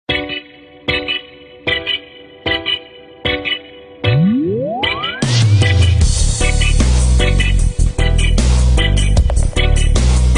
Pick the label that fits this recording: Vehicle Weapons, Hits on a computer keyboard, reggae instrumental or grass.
reggae instrumental